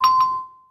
Messenger Ring

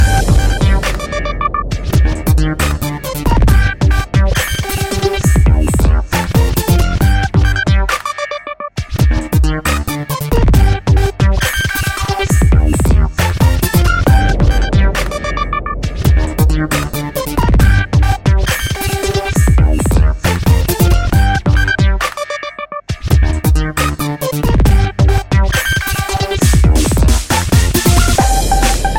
Dubstep Rocker

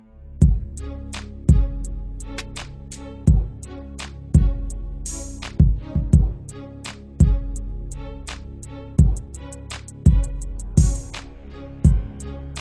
Beat

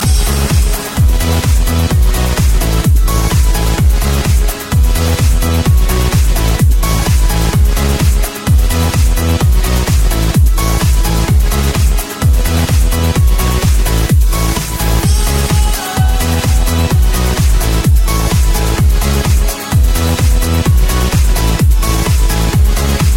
Electro Piano Sms

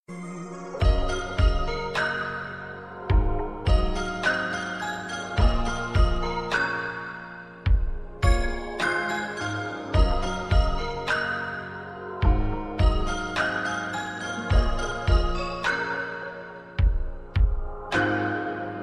Wake Up Sound Zen

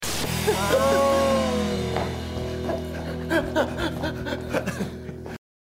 UNHhhh Open

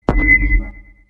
Alien Radar short

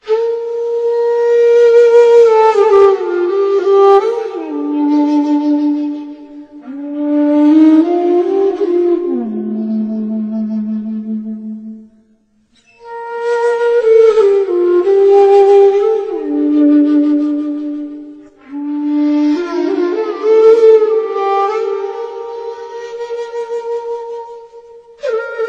Flute; Kisna

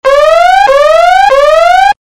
Virus Siren

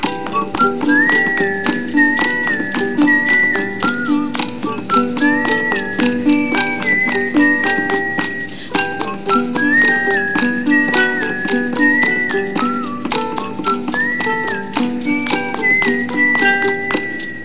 Happy Whistler

